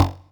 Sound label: Percs, Elektron MachineDrum; SPS1 MKII